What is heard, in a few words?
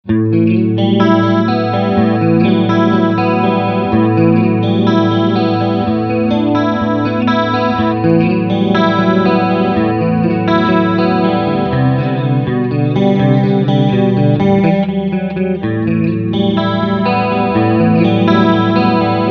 Chorus